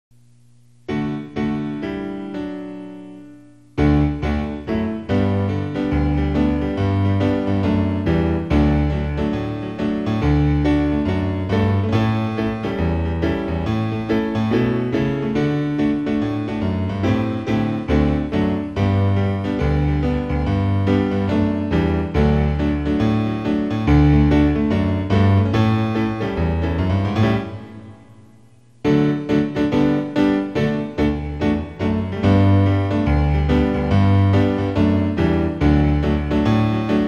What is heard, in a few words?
piano; PSE Music; normal tempo